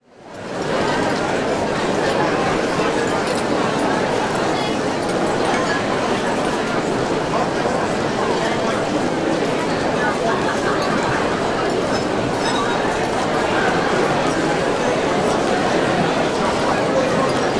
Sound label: Restaurant Large Banquet, Walla; Sound Ideas; Walla Series